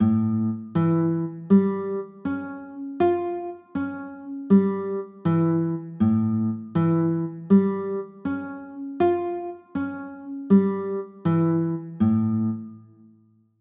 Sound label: a 7 b13, chords